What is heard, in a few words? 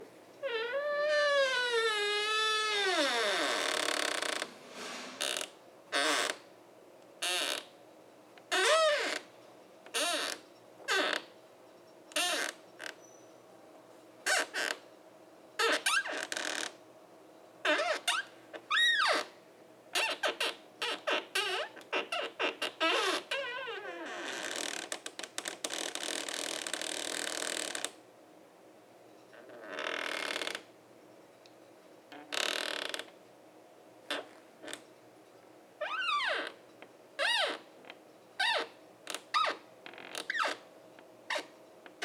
Squeeky Door Household